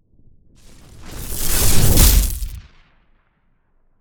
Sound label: Explosive electrical impact explosion shockwave